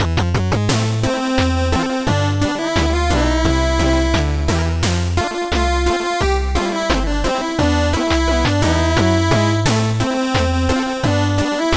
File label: 8Bit Theme; Famous Five